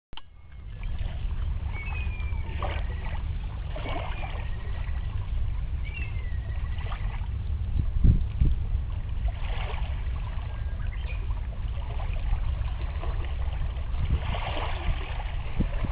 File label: wave